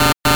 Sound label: Wrong Sound Machine